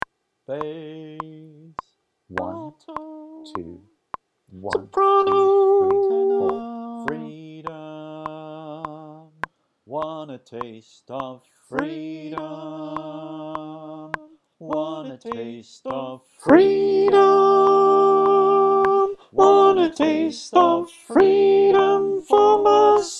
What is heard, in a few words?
- - Soprano w-click